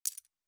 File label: chip, Baccarat